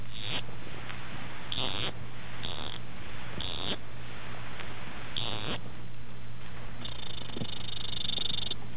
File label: pygmy leaf-folding frog